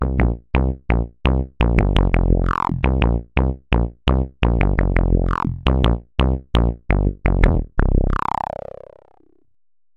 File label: Morph Bass